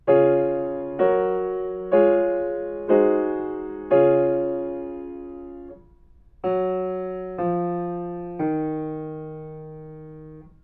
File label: melodic fragments; ear training